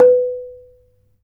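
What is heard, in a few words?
ranat thum B4; Spectrasonics - Heart of Asia CD2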